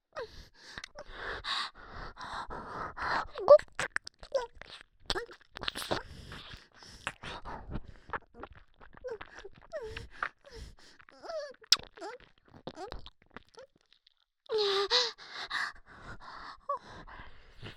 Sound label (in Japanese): ピストン耳舐め, 左耳, ASMR